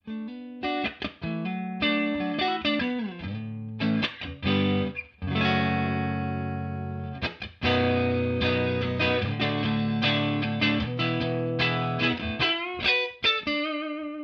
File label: clean